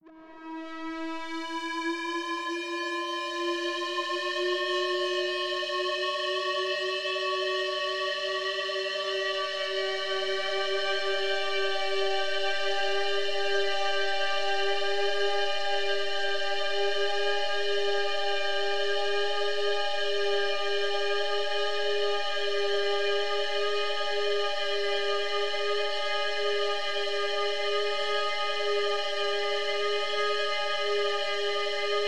Feedback Riser, D; Rr City On Fire; tonight we riot

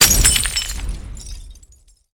molotov explosion

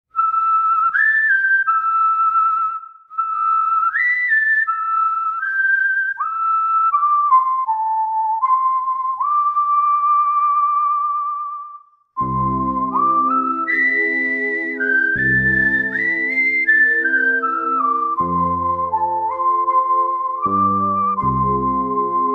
BGM whistle; Yo-kai Watch 3; 2016 3ds gamerip

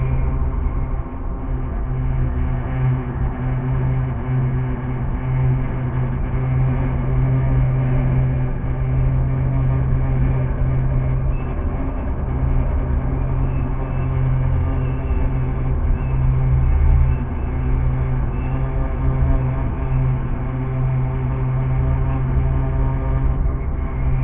River Tension; Outlast 2; PS4 Windows Xbox One

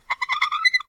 Kill Fire

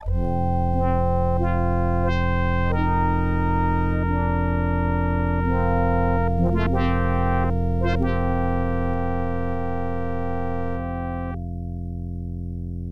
Found Secret Door

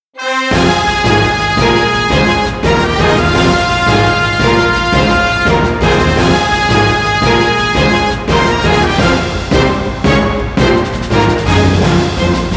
ambient fear; Ragnarok Hikari to Yami no Koujo

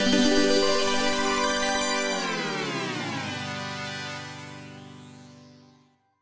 Fanfar; Hanger World; Rope Swing; android

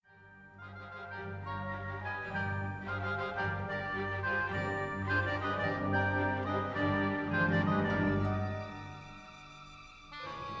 Loading Loop; Endor

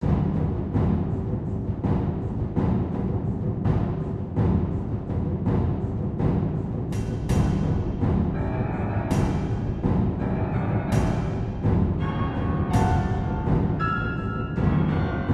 Trap; mass effect 2

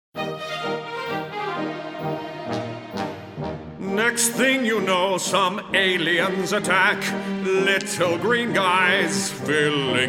Opera; Secret Agent Clank; Madam Butterqwark's Opera, Verse 3; Alien Attack

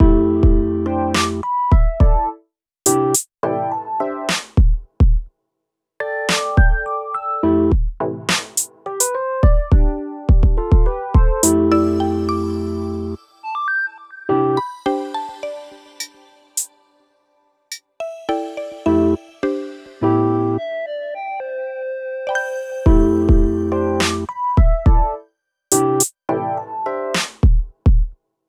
BGM Light Board Western; Clubhouse Games: 51 Worldwide Classics

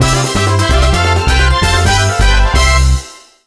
Winning; mario party 6

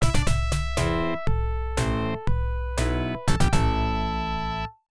Unused Jingle; Flying Tiger

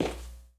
walk tile; Lucius

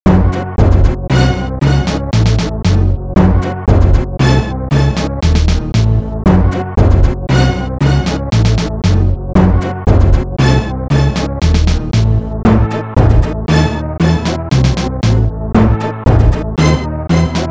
Incoming Message; Judge Dredd; SNES; Type F

